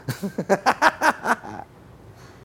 risa

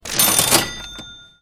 ticket registradora

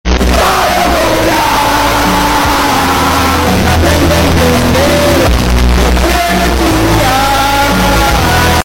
cantando

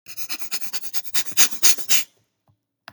suspiro

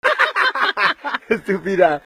perdidas risa

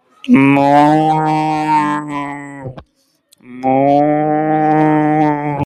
vaca muuuuuu, vaca de minecraft

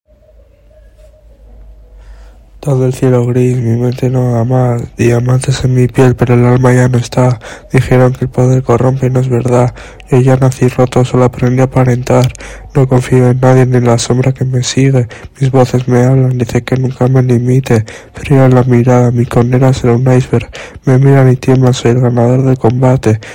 rap

